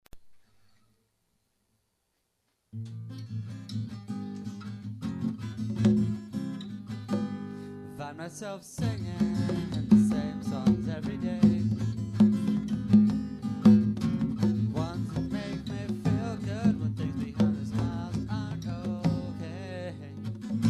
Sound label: Singing